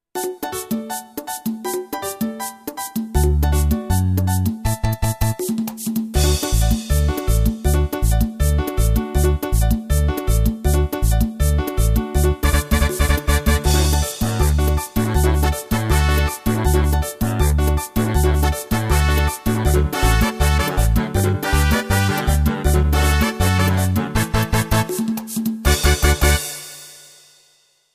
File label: Mereng, Latin America